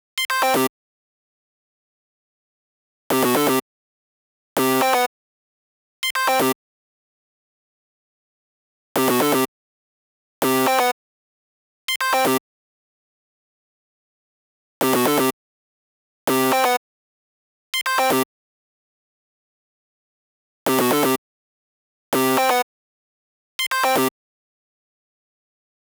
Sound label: guitar runs